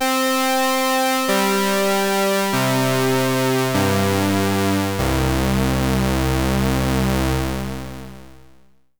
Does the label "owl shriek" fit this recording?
No